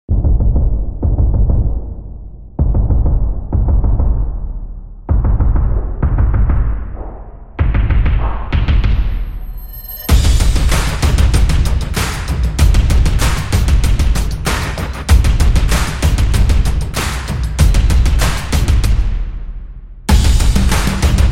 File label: Drum Intro; snaps and claps